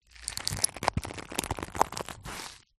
food drink ice cubes in rubber mold bend stress loosen; zapsplat